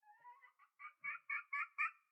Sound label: chicken; fauna